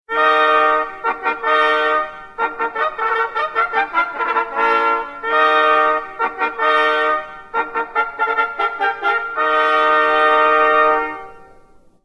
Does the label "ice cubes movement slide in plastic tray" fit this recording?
No